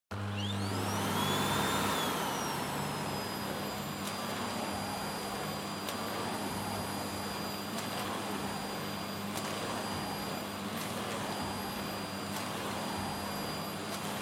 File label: Vacuum Cleaner Vacuum Floor Soft Low; 掃除機; バキューム 床 ソフト 低